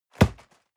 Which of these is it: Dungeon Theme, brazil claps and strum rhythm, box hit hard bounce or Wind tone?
box hit hard bounce